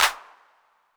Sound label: Clap 808 Saturated A, Hand Clap Multi Saturated